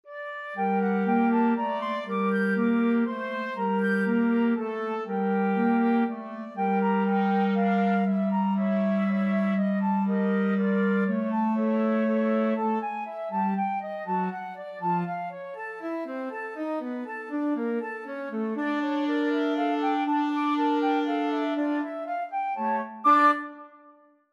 flexible mixed ensemble, 4 players

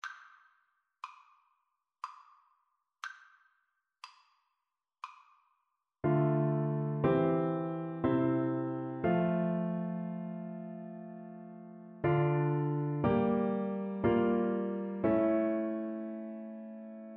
Tenor Saxophone

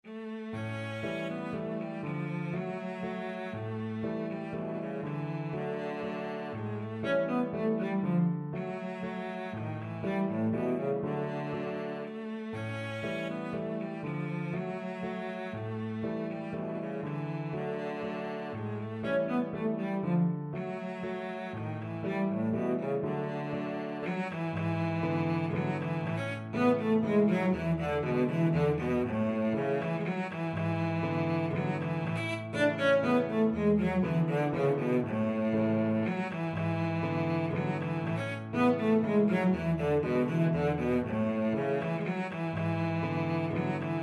2 waltzes, cello

